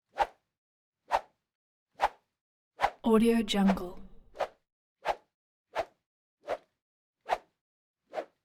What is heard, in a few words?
punch whip; collanderson